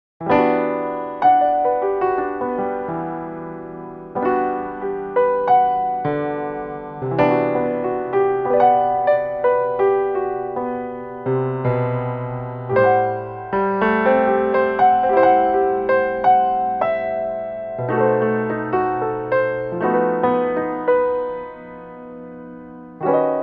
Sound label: solo piano